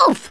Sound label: oof, Drill Sergeant; Worms Armageddon